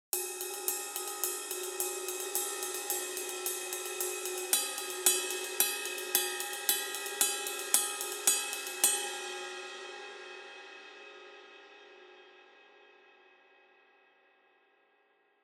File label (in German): Resonant Ride